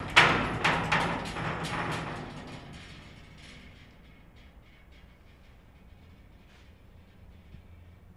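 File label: Jail Door Light Rattle; jail & prison doors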